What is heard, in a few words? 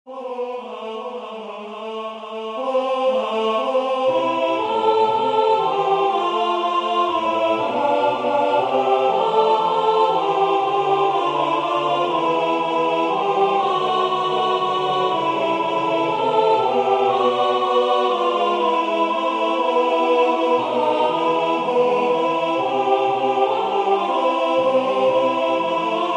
Bass solo chorus Alt; H.Purcell